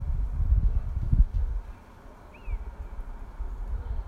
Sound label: Buzzard garden